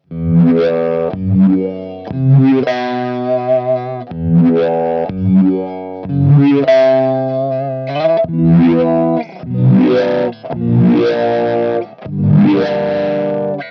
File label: hx synth